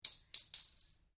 Cartridge 38 super impact ceramic tiles, shell casings; shell casings of different calibers impacting on different surfaces